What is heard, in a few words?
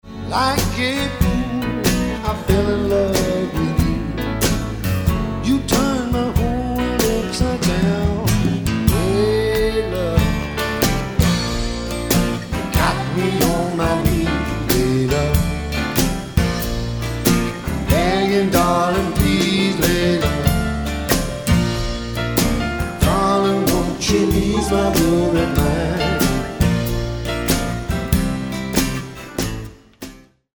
acoustic live